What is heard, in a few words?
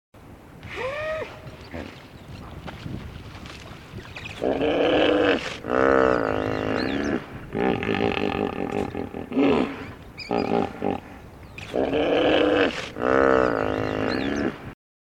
hippo